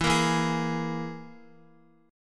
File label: E Major Flat 5th Guitar; strummed; 4F,4A,5C,5G,5C,6E